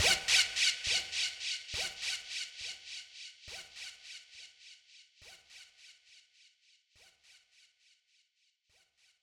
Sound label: Juno fx, 138bpm; Zero-G Sound Sense Grime Scene; Construction Kits; Da Suspects